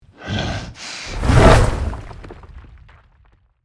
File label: Werewolf jump powerful monster